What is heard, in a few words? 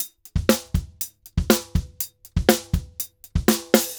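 DRUMS R, salsa drums and percussion; Best Service ProSamples vol.14; AKAI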